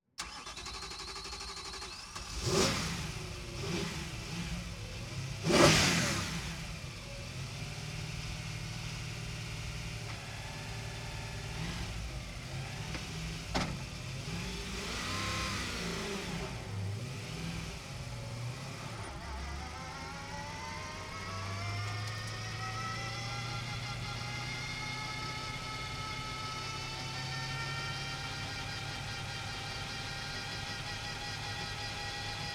BMW Z4 GT3 onboard startup exit pit warmup enter pit shutdown engine; racecar; Pole Position